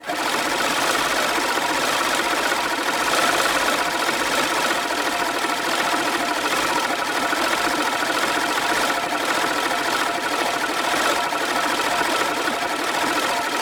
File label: Lawnmower Manual Fast